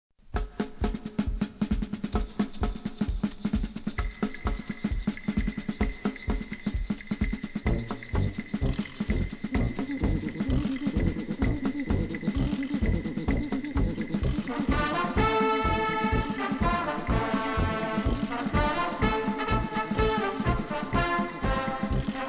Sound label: samba; orfeo